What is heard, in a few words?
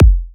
Bassdrums; Vengeance Samples